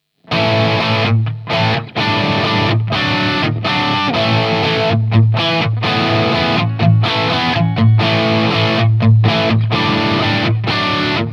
Friedman TMP

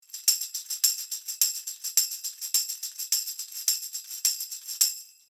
Shure SM58 Tambourin 1 Meter

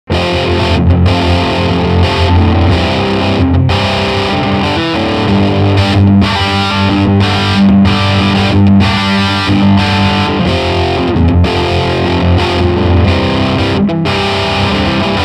Mid Gain Riff

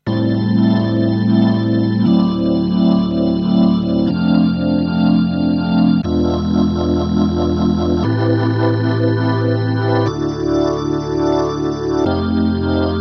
E Organ Neo Ventilator II

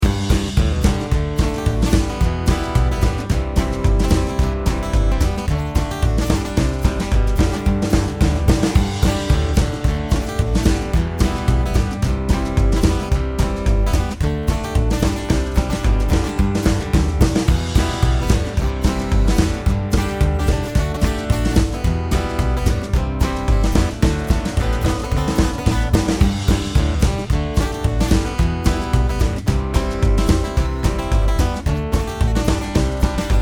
All Flat

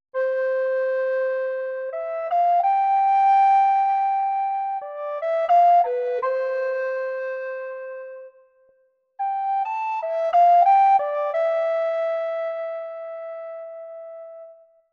Renaissance Alto Recorder

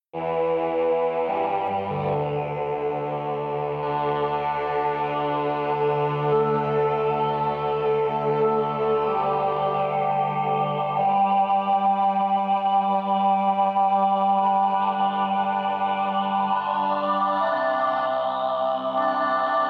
human ensemble phaser reverb formant

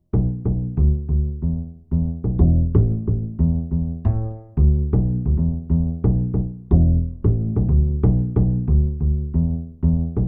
Bass

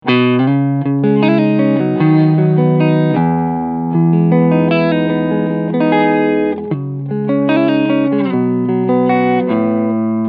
Medium Gain

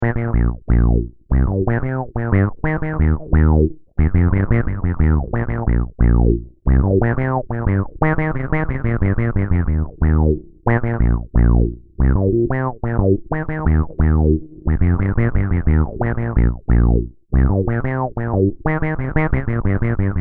Synth

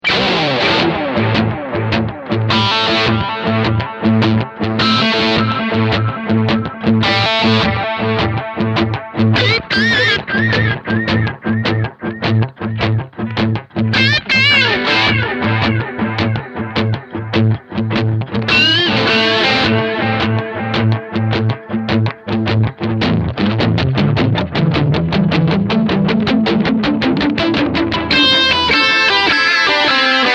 DS1 Delay

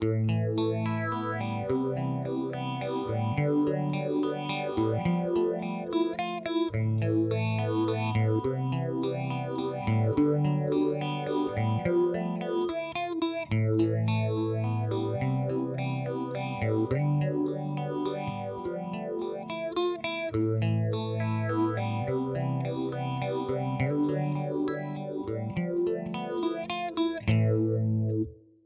Strymon Zelzah 6 Stage Resonance mild

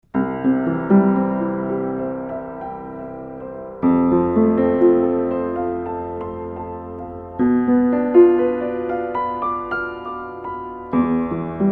PIANO DPA 4099

